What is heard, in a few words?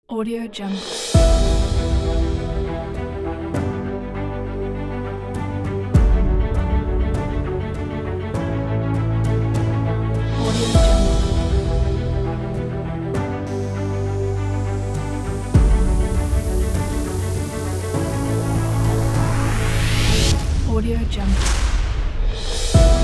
epic heroic inspirational